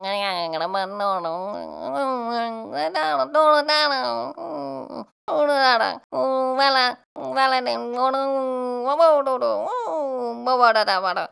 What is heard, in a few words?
scared loop; Hollow Knight: Silksong; Courier